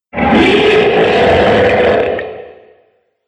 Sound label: Demon Of Distortion, Monster Sound Effects; horror